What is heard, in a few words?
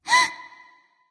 nv breath; smite; awilix